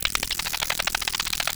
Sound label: ROULETTE, Anime Sound FX; COMIC